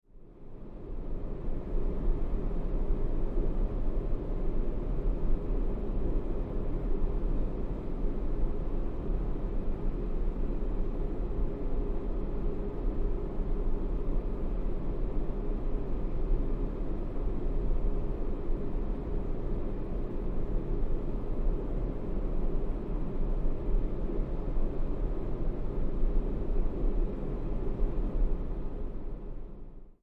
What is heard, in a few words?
Cabin Noise